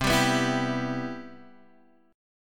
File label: E Minor C